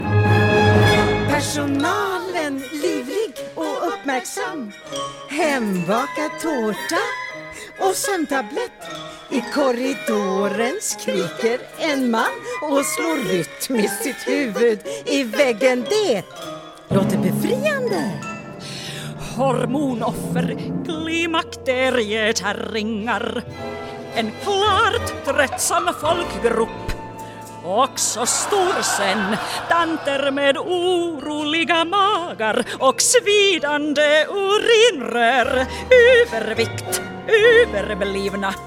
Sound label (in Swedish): cha cha